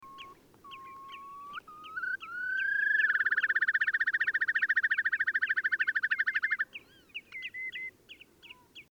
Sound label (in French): courlis corlieu